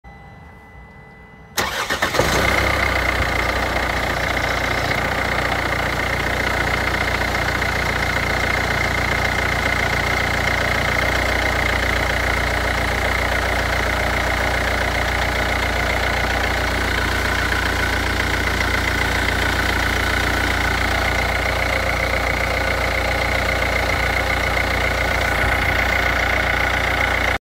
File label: e250 om651 cold start; e-class w212